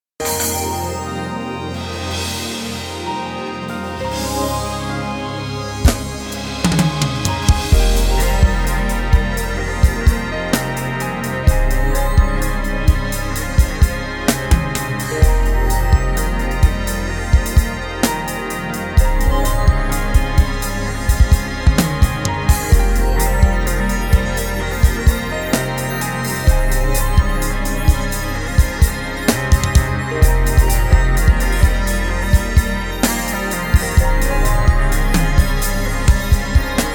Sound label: rnb